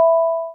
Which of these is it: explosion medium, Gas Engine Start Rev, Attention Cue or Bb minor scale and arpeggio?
Attention Cue